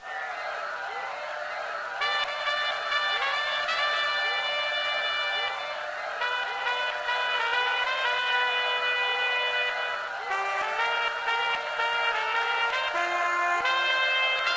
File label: Radio Jazz; WWTw